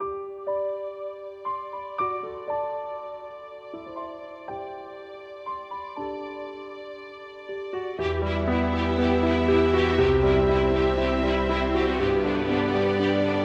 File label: Lovely Ambient